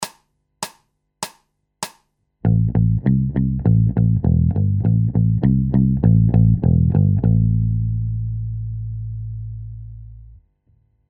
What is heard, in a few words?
Bass Loops